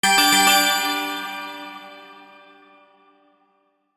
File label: Minigame Bonus Jingle